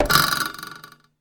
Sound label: arrow hit